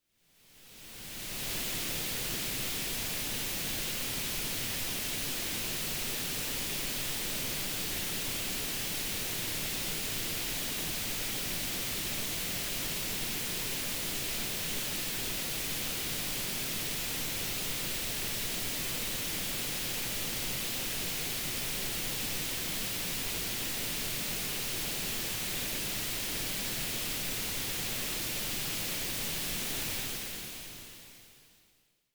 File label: pink noise diff; group test loudspeaker cables 2013; synergistic sr core active a